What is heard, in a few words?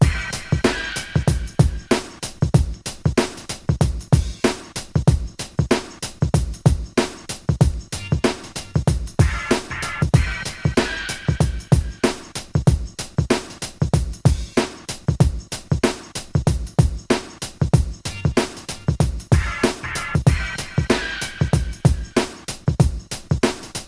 Bonus Beat